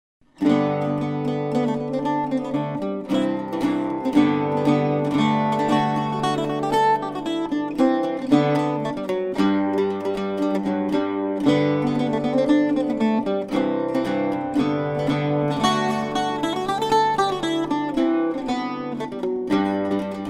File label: bouzouki, octave mandolin; bouzoukis; forster